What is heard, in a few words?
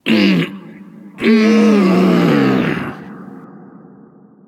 nv grunt lift; cernunnos